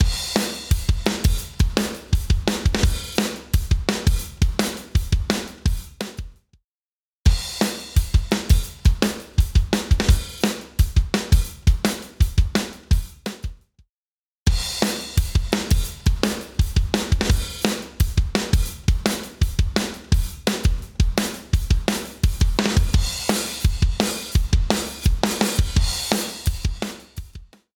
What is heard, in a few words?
Physion Mk II Punch Now Squash Later